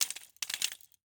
Roller skates, old fashioned, all metal, wooden wheels, clash, strike, clatter, ping, jumbled; Eiravaein Works; Vaeyan IV